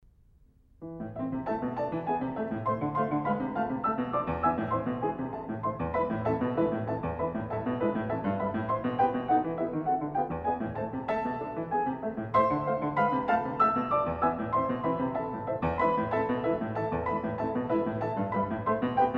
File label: Etude for piano No. 16 in A minor; Chopin- Etudes (Complete)